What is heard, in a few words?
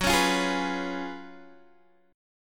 Gb Major 7th Suspended 2nd Suspended 4th, down, Guitar, Open G